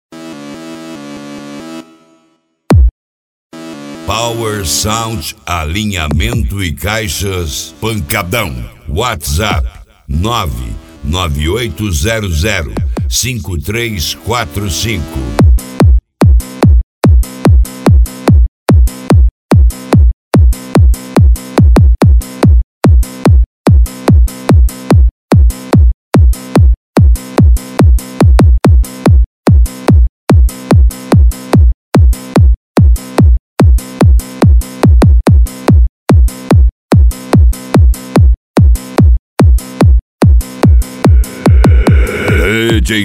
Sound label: pancadao; Power Sound Alinhamentos e Caixas